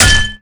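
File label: bottle hit robot; melee impacts; Garry's Mod; tf2; mvm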